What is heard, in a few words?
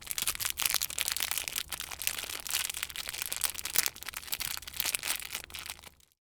Foley